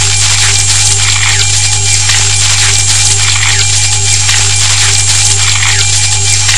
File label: tornado; weapons; svencoop